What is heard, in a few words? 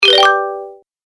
Award - Game Collect; Video Game Sound Effects